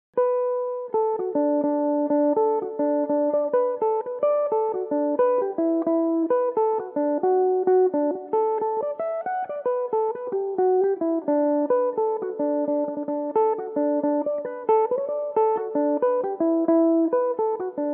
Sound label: jig, D major, mandolin; Paddy From Portlaw; Belmuse EM-190 electric mandolin